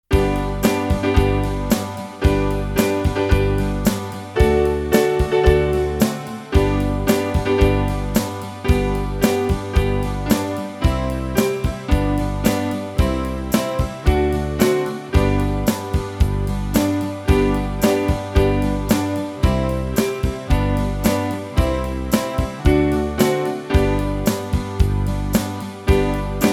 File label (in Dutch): instrumentaal